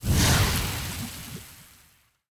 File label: rocket tail; smite; vulcan